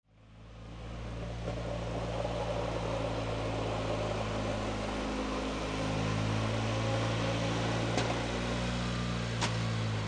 Snow Plow Plowing Driveway, Industry, Snow Plow, Plowing Driveway, Lawnmower Style Plow